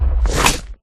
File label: fangs, evocation fangs; Minecraft